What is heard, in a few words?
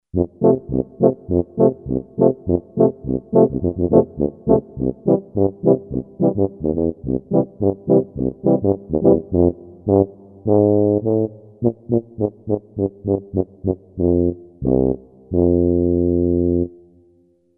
Tuba